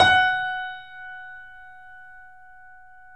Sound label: F#, Boesendorfer, 3D Pianos; E-MU Producer Series Vol. 5; 3-D Audio Collection